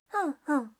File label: funfun, voice reaction